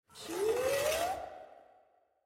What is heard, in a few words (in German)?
piuh schiebetuer